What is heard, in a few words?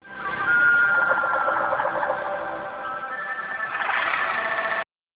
flying plane